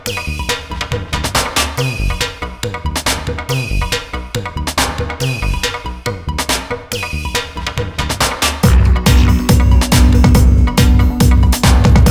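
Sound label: reggae drum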